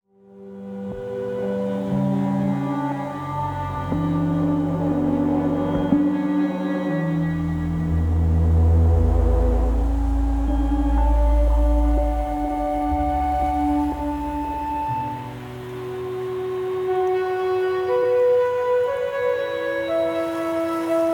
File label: Skysounds